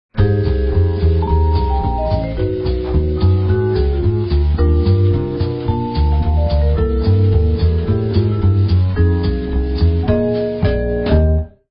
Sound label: Urban; Motorola; RAZR V3xx Telstra